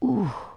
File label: ooh, bloopers; Wired for Sound Pro CD; Aristosoft, Inc.